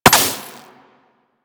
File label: Laser Impact Metal; Warframe; Weapons